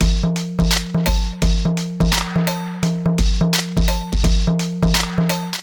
Animals Kit groove 85 bpm; MIDI Clips; Drive and Glow; Ableton; Factory Packs

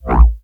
FX FMD Woy Voice; Drums; Ableton Foundation FreePacks

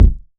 modular deep, Kickbank; Ableton Foundation FreePacks

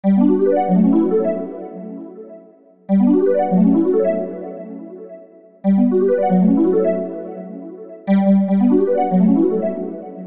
bellz B Minor 86 bpm; MIDI Clips; Tonal; Melodic; Oldbellz; Ableton; Factory Packs; Chop and Swing

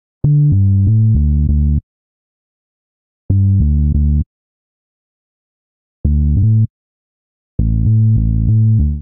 D Minor 85bpm, Bassline; MIDI Clips; Beat That; Ableton; Factory Packs; Chop and Swing

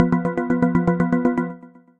Running Late Sequence, Synth Rhythmic; Ableton; Factory Packs; Synth Essentials

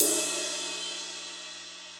Ride Bright Room; Drums; Drum Hits; Ableton; Session Drums Studio; MPE Hits

